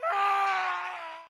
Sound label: dist scream; Zomboid 41.78.16; Project Zomboid